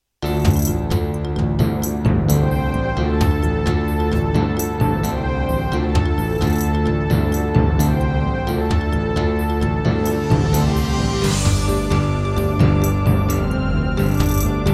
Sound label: Korg NS5R; Heretic